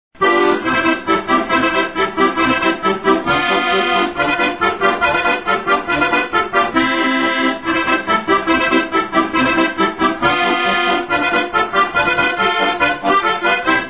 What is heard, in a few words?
random loop